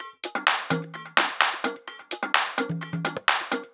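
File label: Drum Loop 128BPM Full no bass drum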